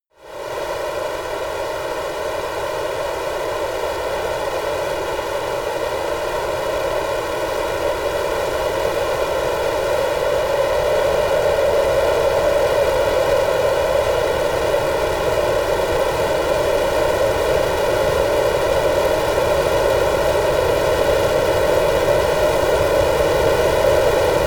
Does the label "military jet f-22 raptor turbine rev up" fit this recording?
Yes